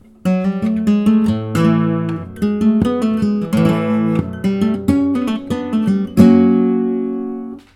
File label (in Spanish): falseta